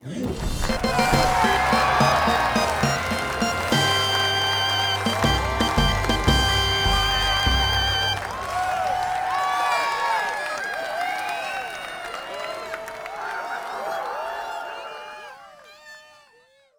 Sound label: End Game Winning; YamaYama; PS4, Windows